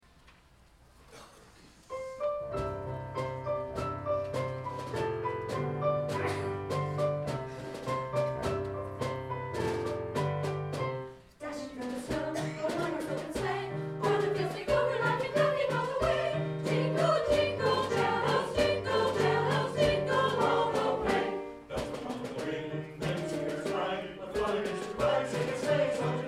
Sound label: Jingle Bells Rondo